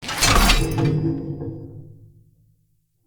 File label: Castle Siege Zipline End; Encounters